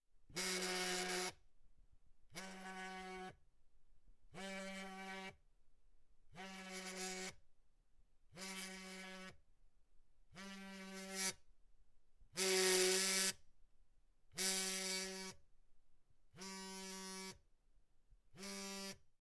zvuk vibracii sotovogo telefona

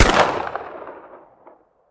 tochnij snajperskij vistrel iz vintovki

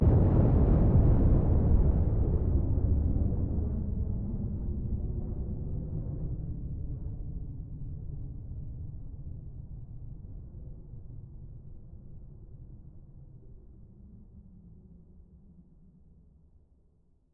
negromkij vzriv vdaleke

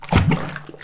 voda potok bulk moshnij aktivnij

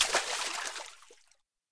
burnij vsplesk ot broshennogo kamnya

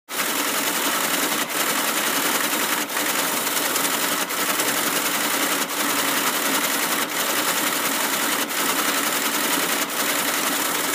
teletajp raspechatka otchetov

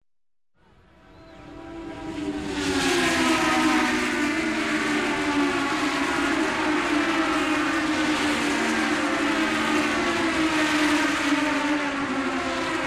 gonki formula-1 proezd bolidov

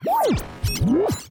ostanovka zapuska puskovoj programmi

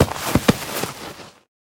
dvojnoj boevoj zatihayushij vistrel

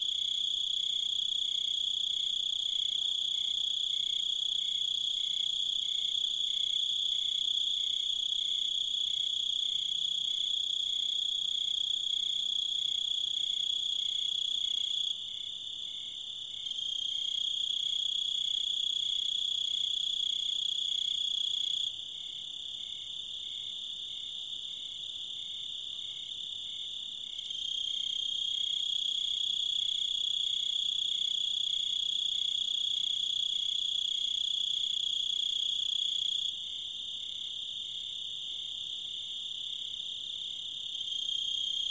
vechernee negromkoe spokojnoe sverchanie sverchkov

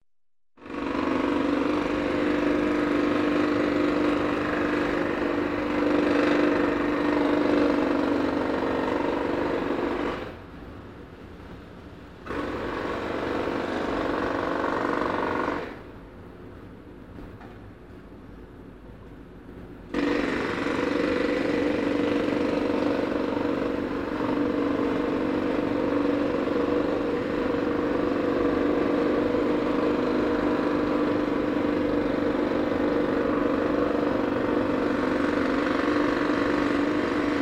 stroitelstvo otbojnij molotok v rabote

